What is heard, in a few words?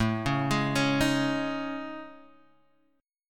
A Major Sharp 11th, Guitar, Half Step Down